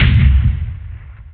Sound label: cannon; Nintendo; July 2020 Gigaleak